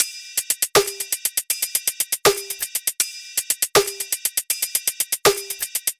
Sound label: Top Drum Loop 160 BPM, EDM; Drums; Loops; Boombox; Cymatics; EDM Starter Pack